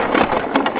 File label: LIFT UP